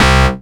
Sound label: S Bass (C), Synth Bass One Shots